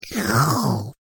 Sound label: slurp